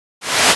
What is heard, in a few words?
whoosh reverse